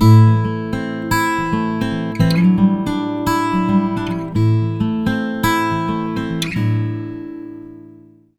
110 MIN PICK A, Acoustic Guitar Loops; USB Soundscan vol.04 - Electric & Acoustic Guitar Loops; AKAI

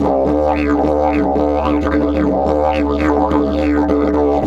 108, Didgeridoo

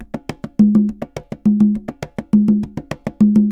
Congas, Festa Latina, Drums & Percussion; Best Service; Festa Latina VOL-1

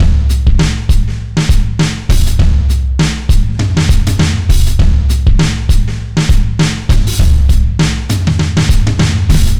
bouncy kit 100bpm

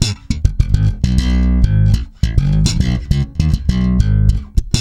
G#, hard funk, bass; Spectrasonics; Bass Legends

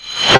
FLYING GLASS

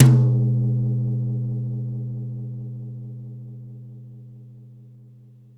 Tom Brush, Korg 05R-W Korg Toms; 300 Drum Machines

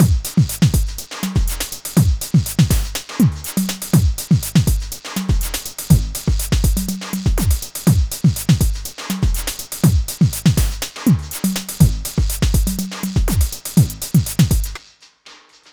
Beat, Drums, Eclectic Electronica

